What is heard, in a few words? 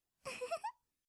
ufufu